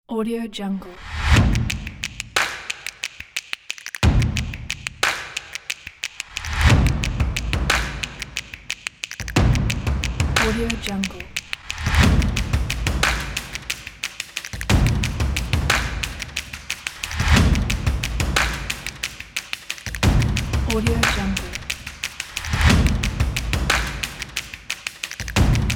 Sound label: the clap in